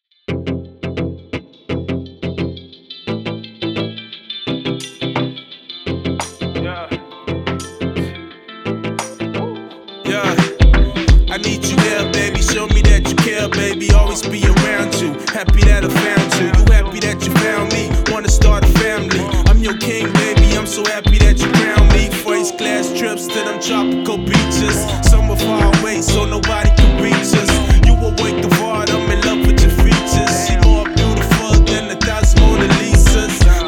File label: hop riffs